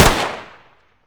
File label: p226 distance fire